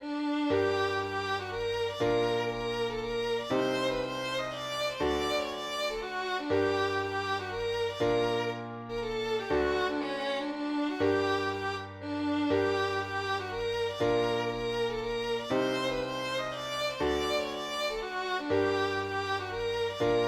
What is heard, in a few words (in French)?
mazurka